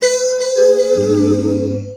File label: message new email